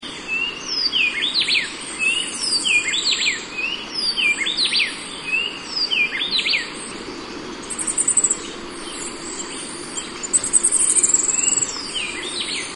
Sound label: dawn